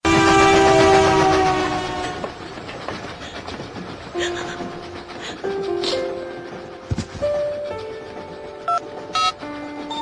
music ringtone; samsung hero

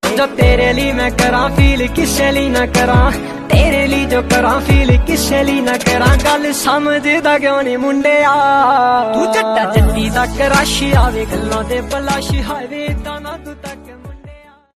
bollywood punjabi ringtone

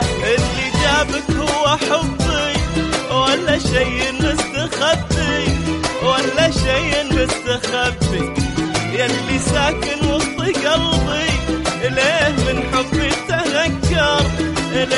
soft music arabic song tone

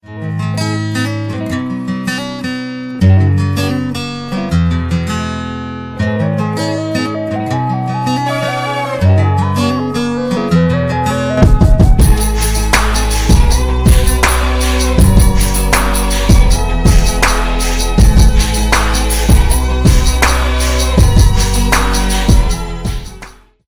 fresh guitar ringtone

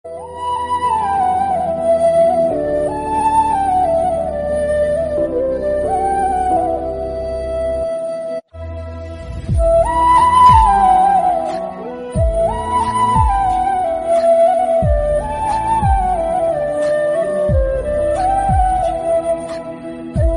emotional sad musical tone ringtone

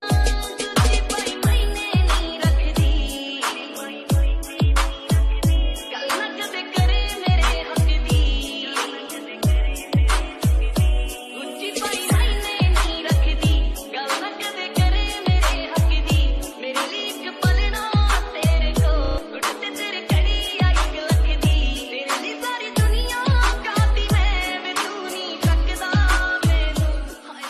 hindi bollywood ringtone; bollywood ringtones

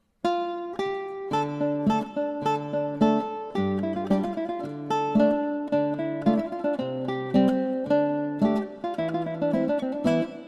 medieval song ringtone

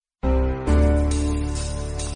beautiful bell ring message tone; sms ringtones

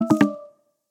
nokia low battery ringtone

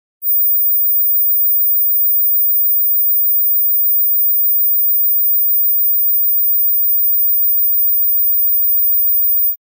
silence ringtone

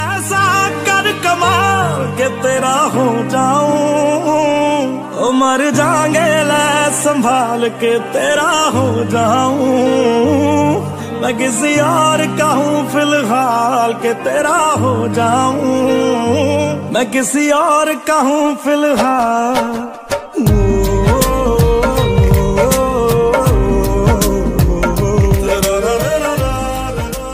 sad ringtone, english ringtones

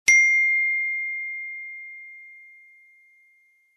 iphone ding ringtone, apple sms ringtones

